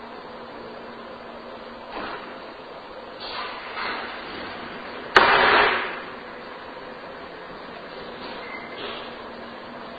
Room with Fireplace Unexplained movement and loud bang Amplified; Turner Home; 2nd Floor